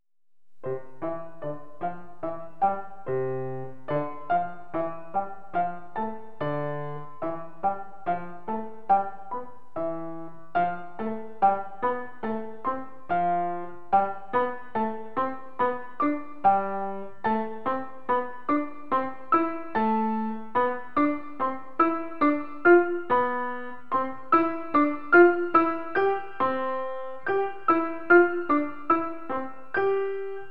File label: Keyboard Pattern